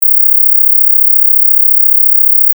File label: mosquito repellent 21000; dengue